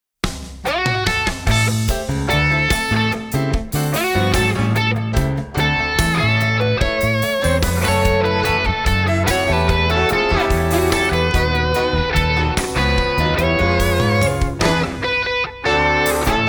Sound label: Exit Music; shrek